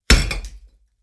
Building blocks dropped on a heavy rock

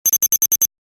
Fast glitchy electronic beeps

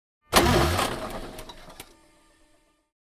Car ignition failing

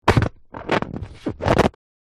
Inflatable beach ball handle squeak

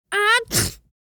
Cute kid sneeze boy child

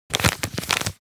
Paper movements crinkling

